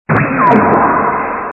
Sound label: helm splitter; uwc3x